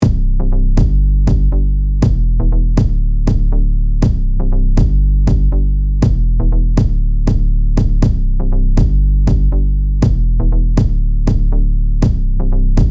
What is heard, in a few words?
kick bass buss compression; ssl gmaster; waves